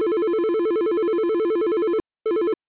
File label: ringer, ringtones